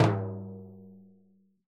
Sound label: TIMBLO, Synclavier Percussion; ILIO; Synclavier Percussion 2; World & Orchestral